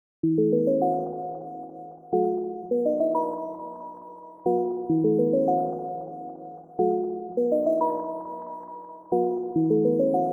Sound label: chords; ytpmv